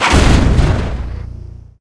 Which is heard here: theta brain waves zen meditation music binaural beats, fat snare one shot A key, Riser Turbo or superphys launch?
superphys launch